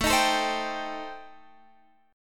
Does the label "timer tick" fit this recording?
No